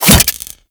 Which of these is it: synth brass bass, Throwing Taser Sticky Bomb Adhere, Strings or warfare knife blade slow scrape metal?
Throwing Taser Sticky Bomb Adhere